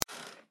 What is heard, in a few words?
Bed Creak